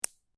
Marble Impact With One Marble On Carpet; Miscellaneous Toy Sound Effects; LynneMusic

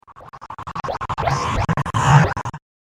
Strange Laboratory, horror; Professor Horror; Scary Soundscape Sound Effects

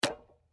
SLEDGEHAMMER METAL IMPACT LIGHT

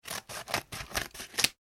office scissors cutting cardboard x4; Scissors Cut Cardboard (4X Snips); McKinney Sound

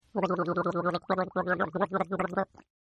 Gargle; Gargling; Voice; Partners In Rhyme

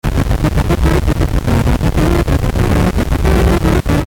Tuner Digital Channel Static; Communication

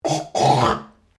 MALE HACK; Male Vocal sounds; Sick; Human

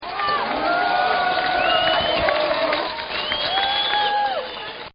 Crowd Demonstration; Crowds; Backgrounds

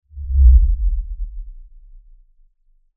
Lfe Descend Low Bass; Descending

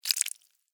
Blood Spill Drip, Horror, Blood, Spill, Drip; Blood and Guts; BlastWave

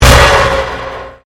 Convolution Pulse

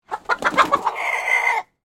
Chicken Squawk, Animal, Chicken, Squawk; Animals; Blast Wave

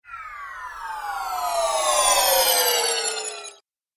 Magical Glider; Magic Sound Effects; Andrew Fleming

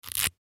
Sticker Peel Off; Ripping; Foley; Blastwave

